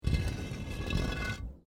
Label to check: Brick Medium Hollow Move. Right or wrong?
Right